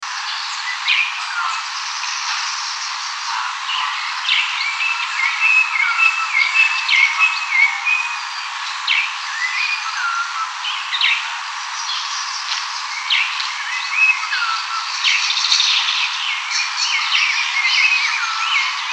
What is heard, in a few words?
red tailed palm thrush, Mbandaka